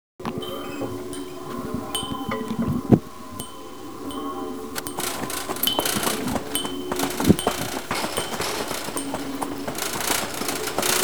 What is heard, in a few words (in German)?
Space Sound